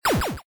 shock; Mindustry

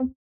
press; Mindustry

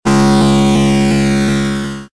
resonate; Mindustry

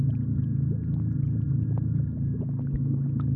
loop Cultivator; loops; Mindustry

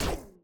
shoot Segment; Mindustry

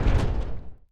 block Break; Mindustry

